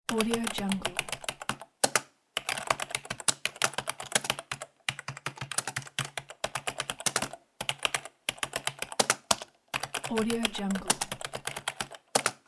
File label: keyboard typing